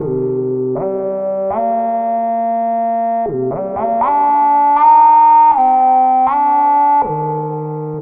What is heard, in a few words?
Melody, IDM